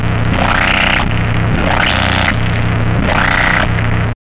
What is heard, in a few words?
META FX, Hard & Loud Techno; USB Soundscan vol.01; AKAI